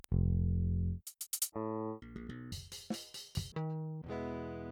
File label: Saturator; Virtual Analog